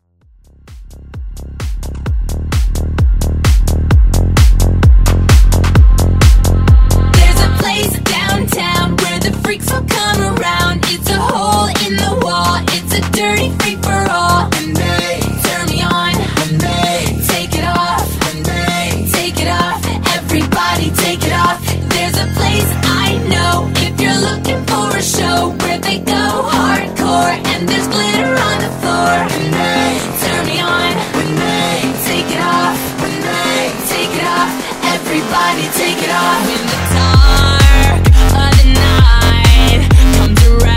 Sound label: House Vocal